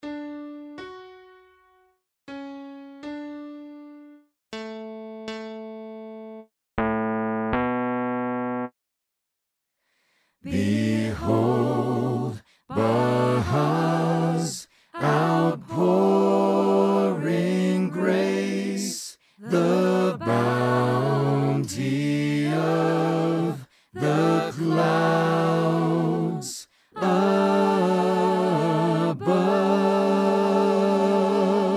bass

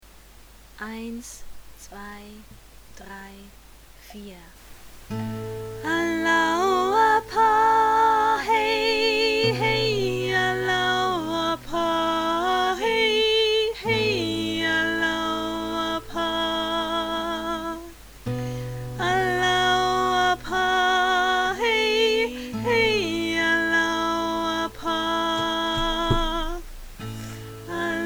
maori soprano, choral music

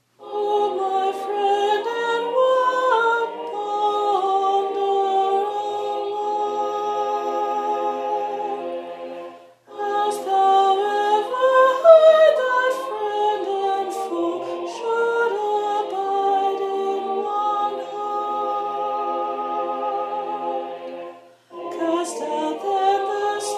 soprano

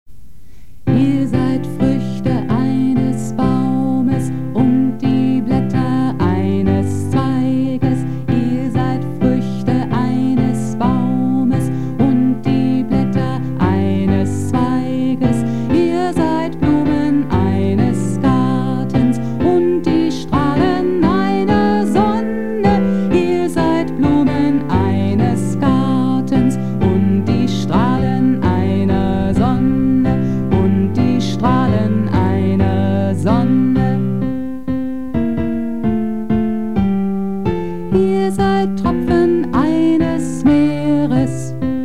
bass, choral music

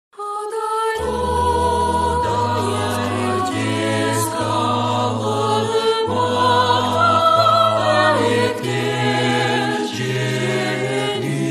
tutti

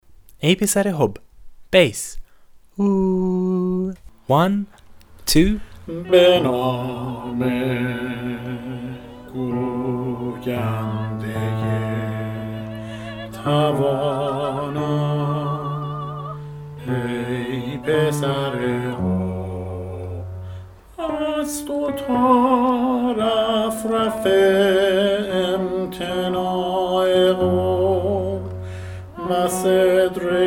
bass, Choral Music; ay pisar-i-hubb

